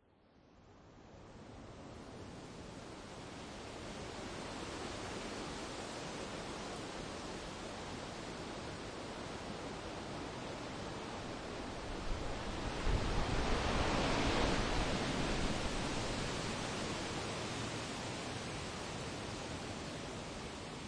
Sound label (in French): Vent dans les pins Binaural